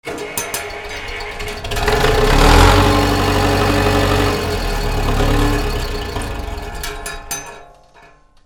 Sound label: VEHICLES